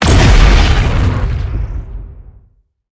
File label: hit; smite; heimdallr